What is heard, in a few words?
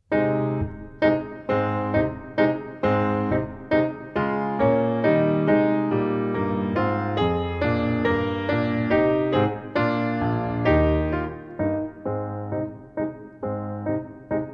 Passepiel accomp, trumpet; Hal Leonard - The Canadian Brass Book Of Easy Trumpet Solos